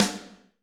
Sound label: Snr Pop N Pic, Alesis D4